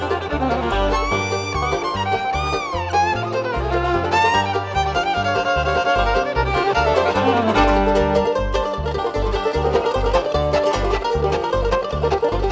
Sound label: Kentucky Mandolin